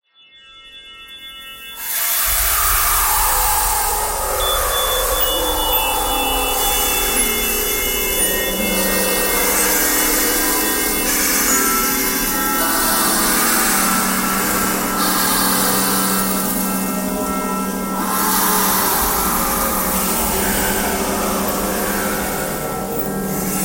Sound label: breathing thread noise